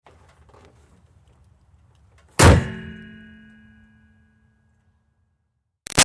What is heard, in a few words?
Car Highlander Hood Close; 2005 Toyota Highlander V6; Cars; Vehicles